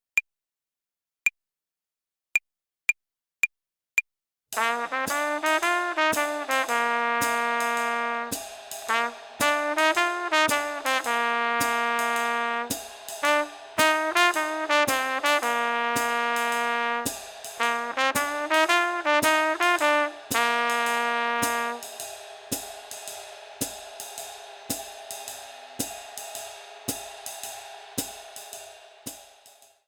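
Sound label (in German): Swing Phrasierung Übung; play along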